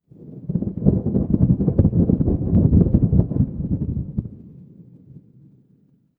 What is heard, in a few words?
ambience thunder